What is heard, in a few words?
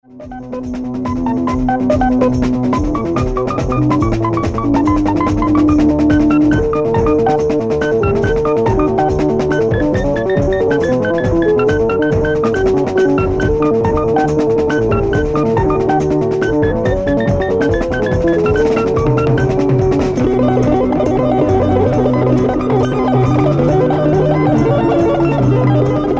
moog quartet